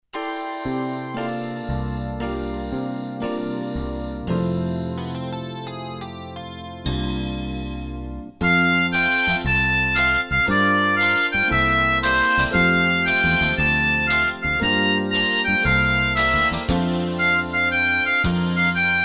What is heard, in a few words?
hymn instrument